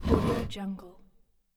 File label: heavy wood drag; stormwaveaudio